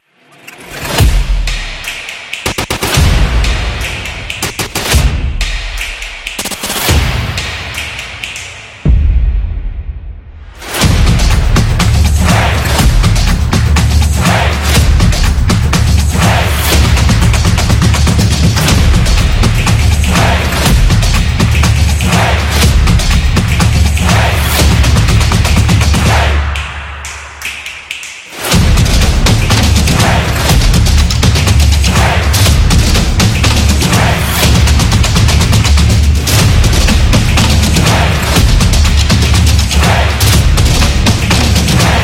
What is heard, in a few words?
Fast Pace